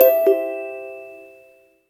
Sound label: Chime, notifications; Yota Yotaphone 2